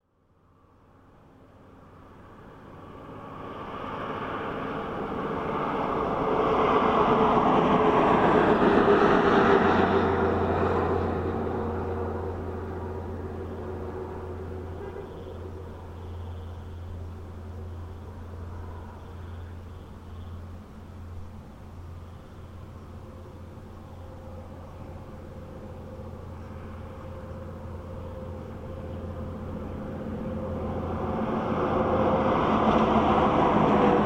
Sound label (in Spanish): Desaguadero ruta 7 km 860